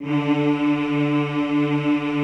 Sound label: D2, Classical Choir; Best Service; Extended Classical Choir